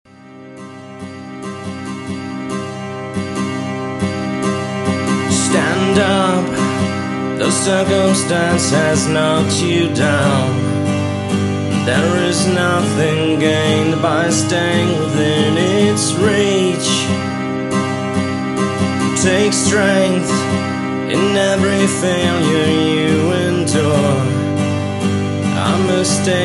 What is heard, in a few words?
Ground Acoustic Version